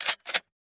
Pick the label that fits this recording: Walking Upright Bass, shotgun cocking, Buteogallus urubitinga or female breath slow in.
shotgun cocking